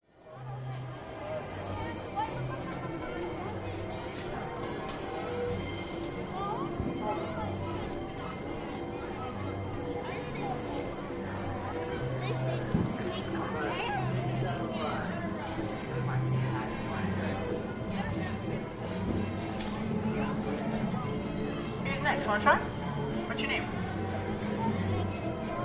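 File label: Carnival Amusement Park, rides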